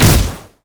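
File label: virulent slash uncharged; LoL; skarner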